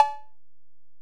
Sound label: EPerc, Alesis DM-PRO